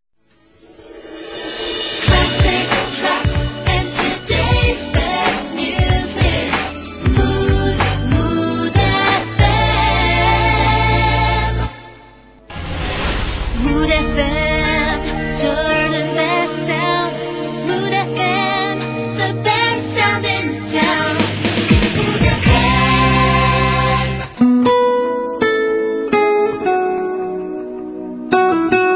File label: interval signal; moodfm